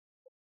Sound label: Morning Hymn